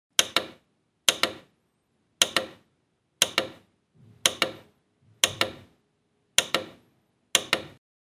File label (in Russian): zvuk knopki